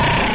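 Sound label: c64 SID random, Commodore 64 8bit SID Drums; LO FI and 8 BIT KITS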